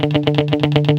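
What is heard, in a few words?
GUI 120, guitar; Best Service Gigapack 2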